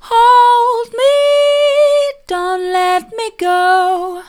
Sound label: hold me dont let me go soft, vocal